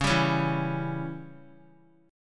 C sharp Diminished C sharp down Guitar 4F 4A 5C 5G 5C 6E; C#dim strummed; JGuitar